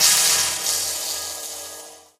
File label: lightbridge elec, footsteps